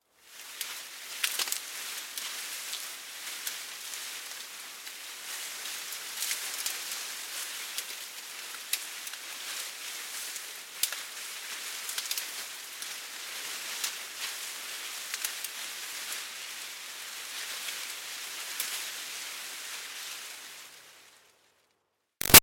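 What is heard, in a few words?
Leaves Medium Leaf Wind Moves Rustles Snaps; Weather; Big Room Sound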